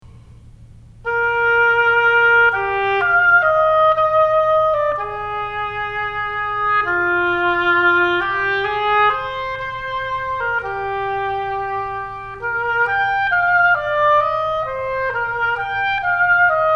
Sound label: Oboe High School